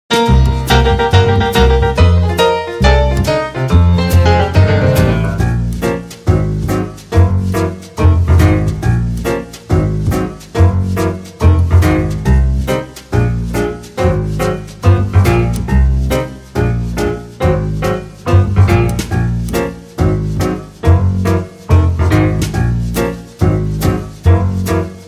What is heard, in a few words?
HONKY TONK CLARINET